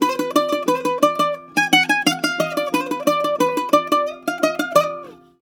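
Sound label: A#, bouzouki; USB Soundscan vol.53; Mediteranean Traditions; AKAI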